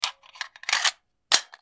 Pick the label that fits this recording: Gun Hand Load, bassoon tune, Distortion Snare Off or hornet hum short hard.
Gun Hand Load